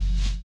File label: HI RIDER; Spectrasonics; Metamorphosis